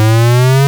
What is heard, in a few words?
Restore Up; Centipede